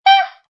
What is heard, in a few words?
A saxophone stab.; Horns